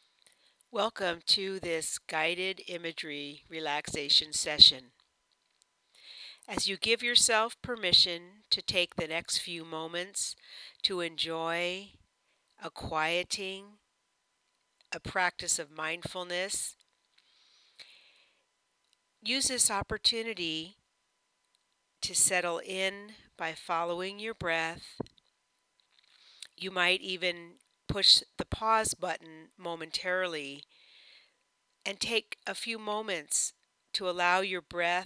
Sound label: after the rain